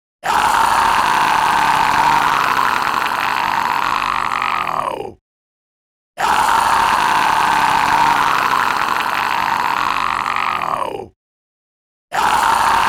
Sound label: Long Scream